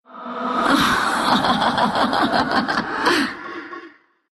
laugh, ru

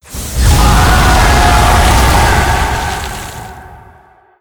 Ghost Ship travel

